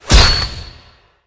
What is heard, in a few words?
skill explo